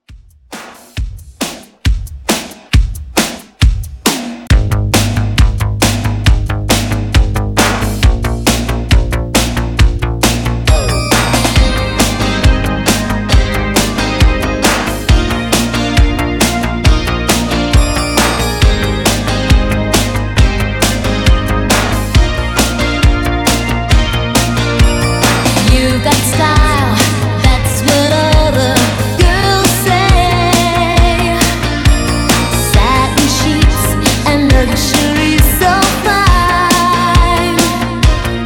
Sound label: re drum